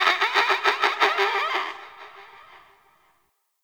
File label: Forest Distant Medium Creature Small Call, Ambience; Warframe; Grineer